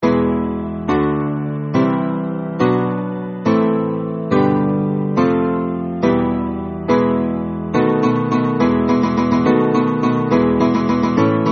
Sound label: GuitSolo; Los Pastores